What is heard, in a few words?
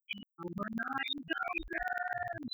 popping